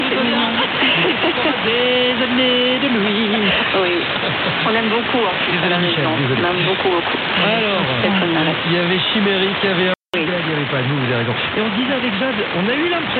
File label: 234 kHz, radio; RTL